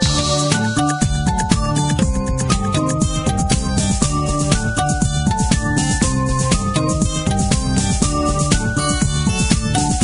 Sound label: Bonus Music; Ballistik